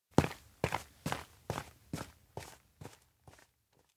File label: steps indoor vet walk away; spica; anemoi パッケージ版 1.1